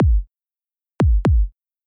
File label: kick; Ритмическая фигура бас-бочки 2; «эхо удар» бас бочки; для достижения эффекта «эхо» надо сделать последний удар чуть тише предыдущего